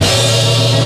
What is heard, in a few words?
noise